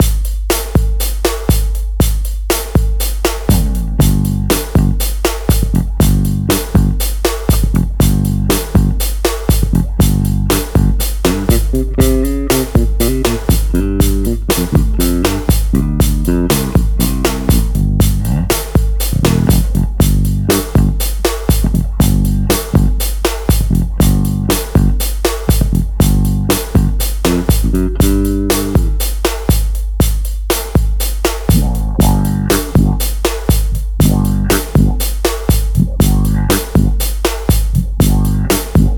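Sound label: envelope filter shootout, bass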